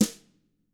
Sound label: TAMA; ILIO; Double Platinum Drums 1